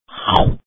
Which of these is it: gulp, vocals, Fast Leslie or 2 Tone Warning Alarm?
gulp